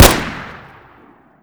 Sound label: close